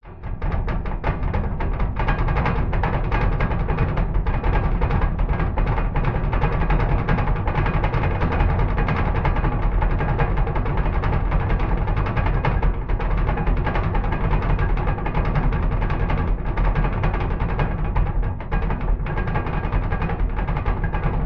industrial mine train carriage roll rumble tracks slow designed